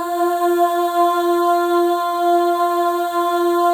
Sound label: Voices, FEM AHS; Spectrasonics; FEM.2 AHS